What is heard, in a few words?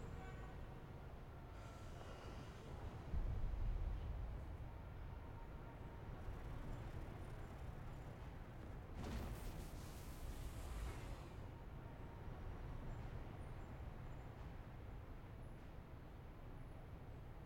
ambience loop; tft set8